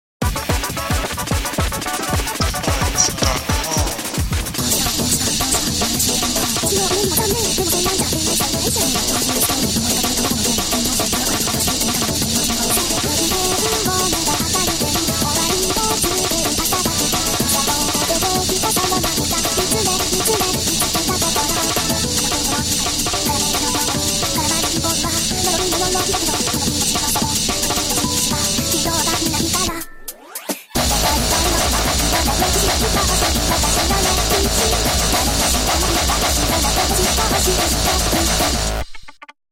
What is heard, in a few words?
snake scales